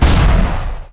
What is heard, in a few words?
boom